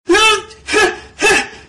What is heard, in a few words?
scream Cutted